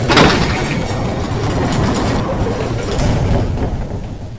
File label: door open